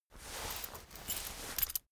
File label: key ring mid size pocket fetch